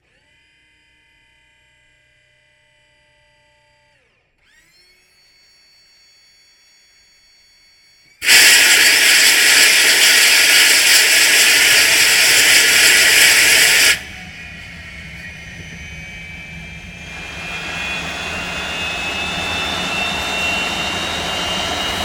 AIRCRAFT CA-27 SABRE STARTUP; CA-27/F-86 Sabre; Airplanes; Vehicles

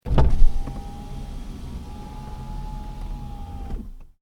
Car: Electric Window Open, Interior; Cars; Vehicles; Stuart Duffield

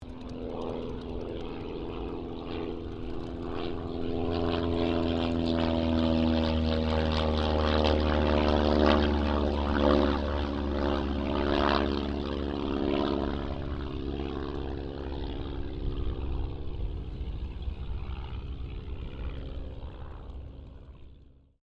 AIRCRAFT AUSTER III PASS; Airplanes

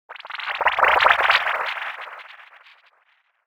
Warped Water Troubled Bubbles; Andrew Fleming

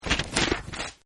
Page Turn; Offices; Partners In Rhyme

